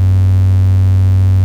BUCHL, Rare Synthesizer; Hollywood Edge; Giorgio Moroder Rare Synthesizer Collection

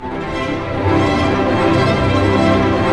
STR ARP MA, Symphony Orchestra, STR Arpeggio; Roland LCDP08 Symphony Orchestra; STR Arpeggios

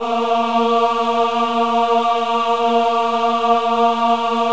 VIB A#3, VIBRATO, Voices; Spectrasonics

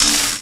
Perc Hit, drums; Drums & Drones

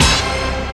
Euro Hit, Roland BK-5; Loops & Phrases

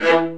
Spicato Basses & Celli

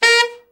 BRA TENOR SN, SAX; Best Service Gigapack 1; BR SAX 4

